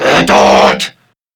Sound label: Surprised Crewmen; Warframe; CorpusCrewmen; CrewmanA